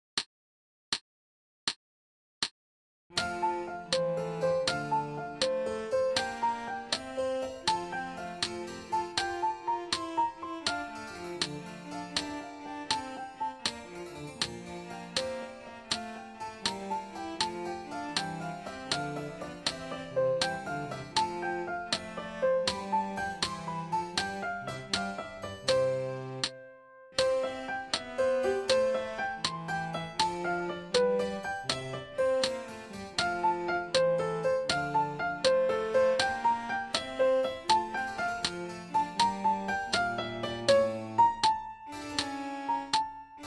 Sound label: giga, no repeats slow tempo with solo